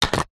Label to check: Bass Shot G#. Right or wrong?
Wrong